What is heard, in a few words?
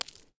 Shell 39mm gravel; STALKER; IWP